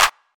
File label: dry clap single shot c sharp key, Royality free clap tuned to the C# note. Loudest frequency: 2936Hz; single hits one shots